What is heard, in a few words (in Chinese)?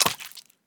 海豚进食声